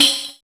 Mazhar Tak, Tambourines; Percussion

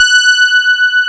PA, ANA PAD

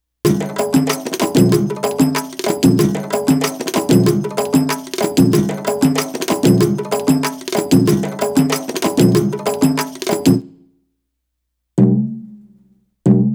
Arabic Rhythms

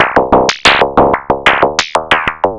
FM Perc Loop, Analog Glitch

